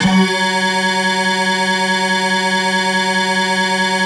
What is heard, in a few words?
synth clav; Best Service ProSamples vol.55 - Retro Sampler; AKAI